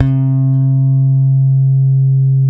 PRECISION BS D 4, Bass; Spectrasonics; Bass Legends

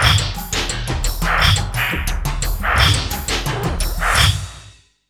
87 VRAC END 3, Industrial Loops

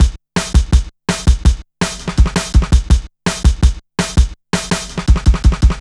Shuffling Live, Jungle Drum Loops; Zero-G Jungle Warfare 1, 2 & 3; 90 s Sample CDs

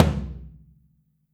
FLTM OH R, Kit OH Mic, Drums Percussion

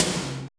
SEATOM HI; POP-DNC 2

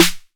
808 SD Tape Sat, Snare Drum; Tape808; Goldbaby Tape808 & Tape909

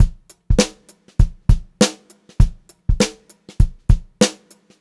BEAT, Funk-Groove Drumloops

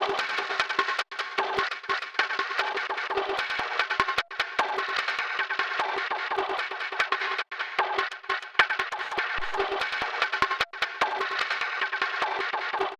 Synth Bamboo

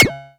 percussion; FutureRetro FR777

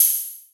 Noisy Thing; EMU Xtreme-Lead-1

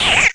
GIT, Drum & Bass, 145 BPM; Ueberschall; Drum & Bass Flash Trackz

